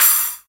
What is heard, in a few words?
crash cymbal sound g key